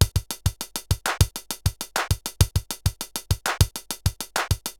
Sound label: RX17 (100BPM), cyborg beats; musicradar